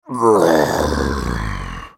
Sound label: Wolfman dying; character voices english